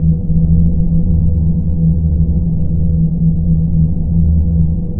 empty drone; subchamber

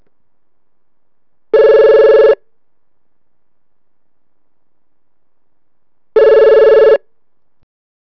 phon offc; cs showdown

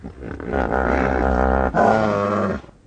squid die

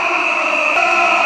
"oooh"

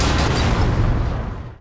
door stop

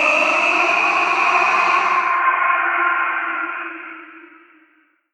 aaah

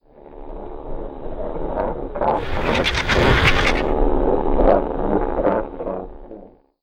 condor loop; ambient; morass beta

